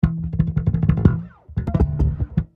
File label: Bass Slap E 090